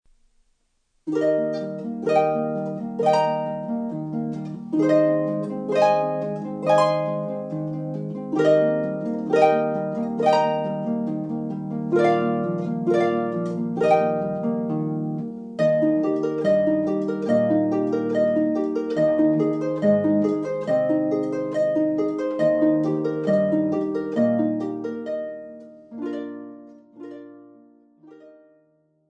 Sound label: Sonatina, harp